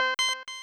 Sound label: button; Sphinx button; mother2